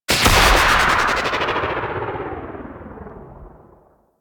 Archwing Healer Engine Boost; Warframe; Vehicles

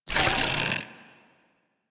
Bow Draw

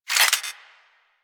Turret Closet Open; Ambience; Warframe; Corpus Ship Remaster

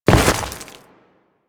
Step Ice, Footsteps; Warframe; Grineer

